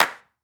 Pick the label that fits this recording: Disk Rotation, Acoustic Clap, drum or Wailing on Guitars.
Acoustic Clap